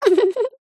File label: cartoon character cute high pitched shy laugh